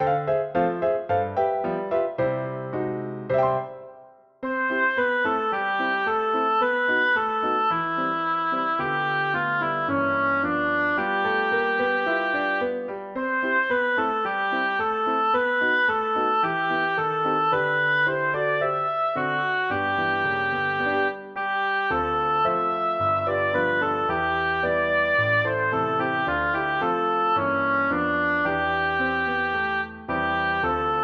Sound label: for oboe; synth